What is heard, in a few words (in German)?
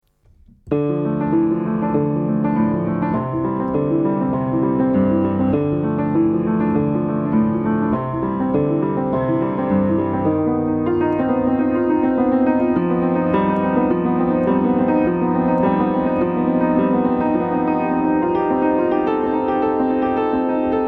Klavierimprovisation